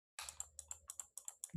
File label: mouse